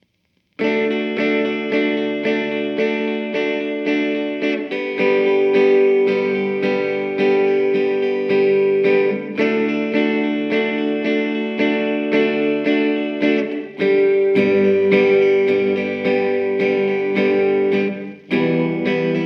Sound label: Sadly Beautiful Reverb; Vox AC4 Mods